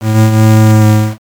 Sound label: creak